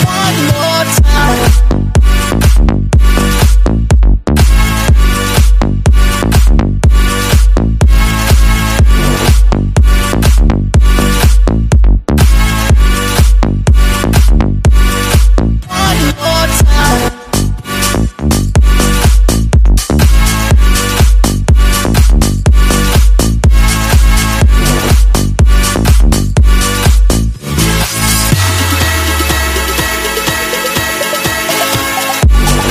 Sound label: music; cstrike